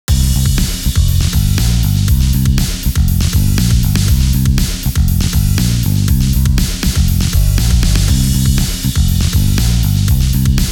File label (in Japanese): グルーヴ